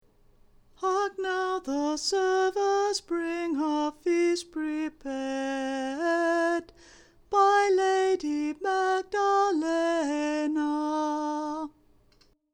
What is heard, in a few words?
feast voice, chant; High Voice (Antiphone)